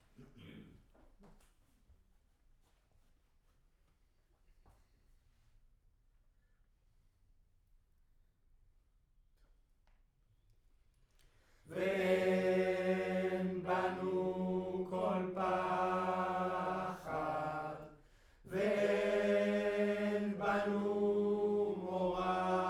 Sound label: Room, Choir